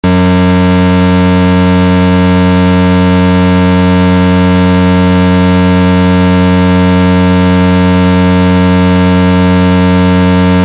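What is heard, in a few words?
Siren Tone AIR HORN (RUMBLER); UNITROL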